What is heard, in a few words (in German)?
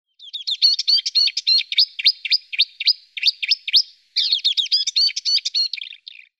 Sumpfrohrsaenger Acrocephalus palustris; Vogelstimmen